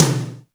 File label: TOM MID; Back In Time Records; Big Bang; THE ROOM